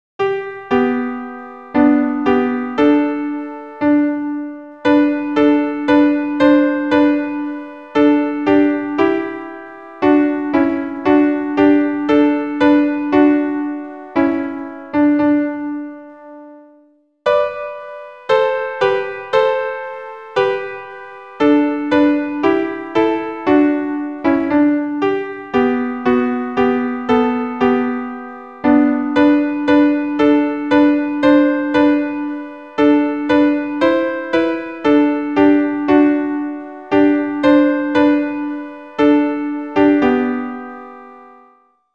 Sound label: Adeste Fideles, r.h. only